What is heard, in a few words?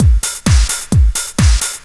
straight, Techno Trance Essentials drum loops